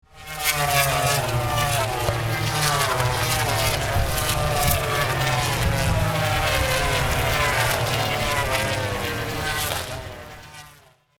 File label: Racing SE